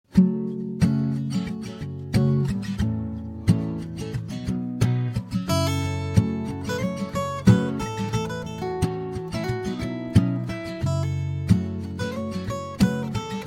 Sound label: solo; Opakování BUM-5